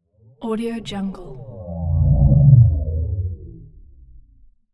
alien moan; hollywoodedge

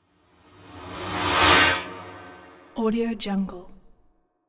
spectral woosh; asylum

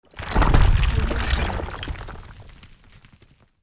rock fall large rockslide

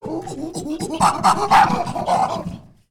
chimp grunts; prosoundfx

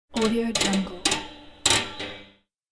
metal spring noise; fxprosound

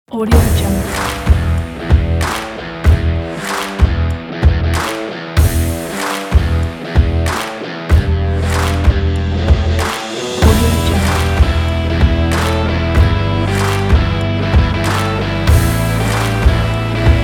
motivational epic rock energy

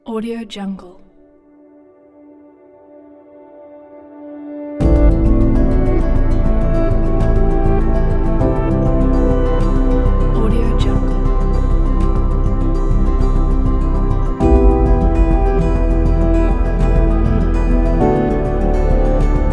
adventure background

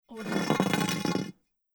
heavy metal pipe scraping stone

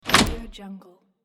industrial switch

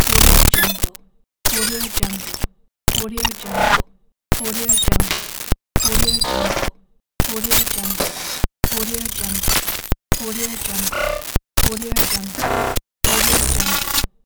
glitch; umcsound

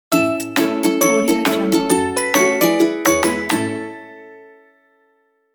kids simple logo

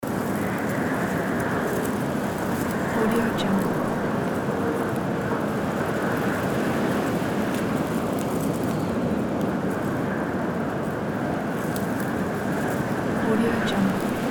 windstorm

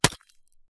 foley destruction sweetener organic; impact hit

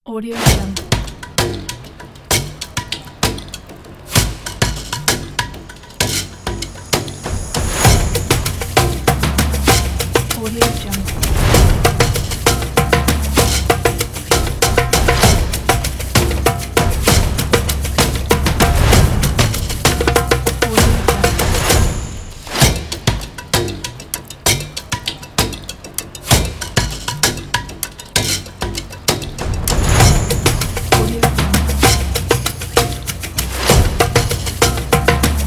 african percussion

